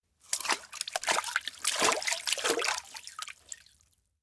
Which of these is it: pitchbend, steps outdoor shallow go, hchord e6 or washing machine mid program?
steps outdoor shallow go